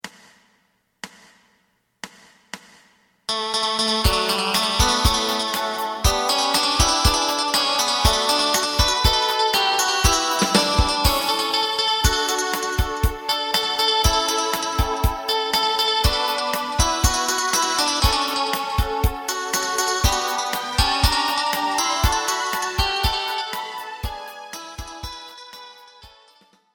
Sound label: bass